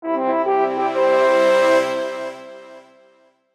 multimedia game musical success complete orchestral horns